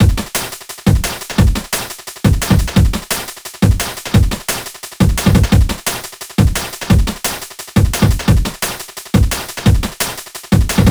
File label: chiptune DnB; flstudio